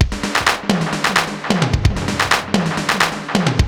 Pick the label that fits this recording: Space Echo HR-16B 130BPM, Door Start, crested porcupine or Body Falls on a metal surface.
Space Echo HR-16B 130BPM